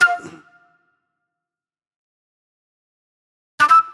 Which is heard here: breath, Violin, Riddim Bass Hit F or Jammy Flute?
Jammy Flute